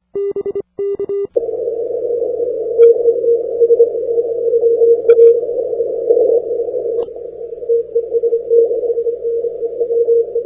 80m CW, CQWW CW; CW-LP; SN3A

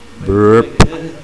burb

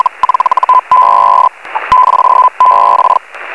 feldhell

